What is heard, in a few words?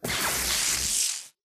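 impact bullet energy titan shield arc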